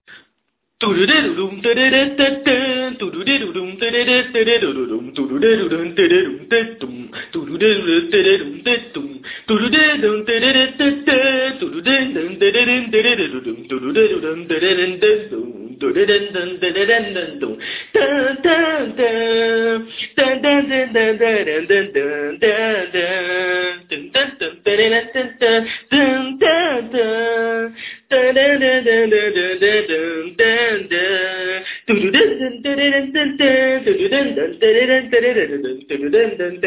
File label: game music